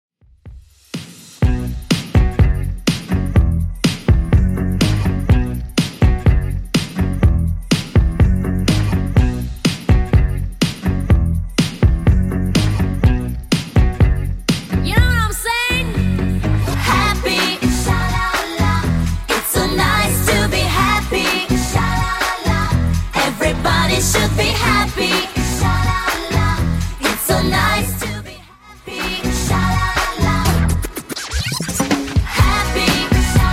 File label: 90s Redrum 124bpm